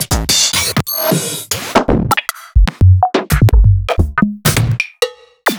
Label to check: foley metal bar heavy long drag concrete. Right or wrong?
Wrong